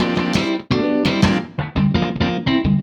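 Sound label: Guitar Funkjaz, Drum N Bass; Sonic Foundry (Sony Creative Software); Knutrix Drum & Bass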